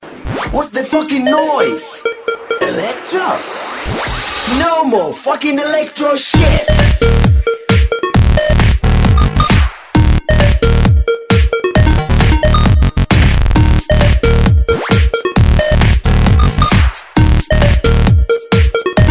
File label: Electro